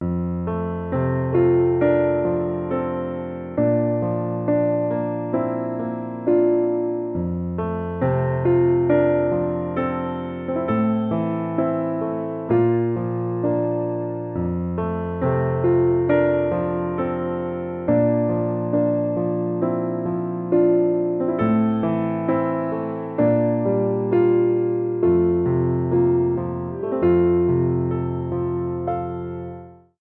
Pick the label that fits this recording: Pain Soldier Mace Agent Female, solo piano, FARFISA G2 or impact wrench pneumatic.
solo piano